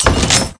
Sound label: mystery open